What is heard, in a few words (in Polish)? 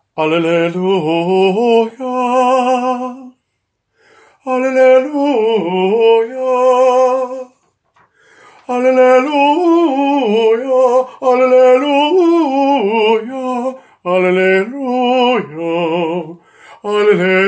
SOPRAN; Alleluja Mawby